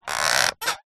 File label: plastic creak stress; zapsplat; foley